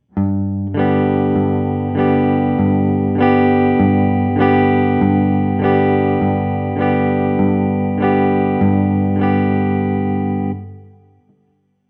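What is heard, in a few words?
Basic Right Hand Strum Pattern, guitar; introductory guitar skills worksheets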